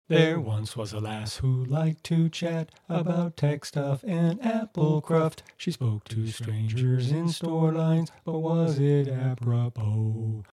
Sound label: Shanty Vocal Verse